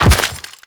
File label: Step Dirt, Footsteps; Warframe; The New War; Characters; Kahl